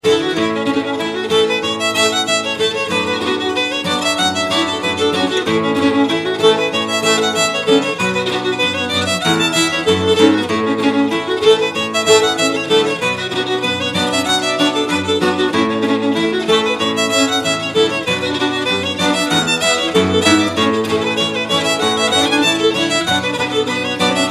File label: 2 fiddles and guitar